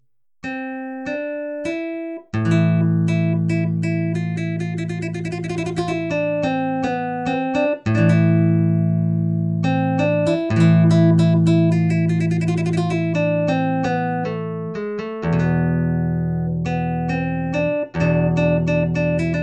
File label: Guitar Sim Spanish Impro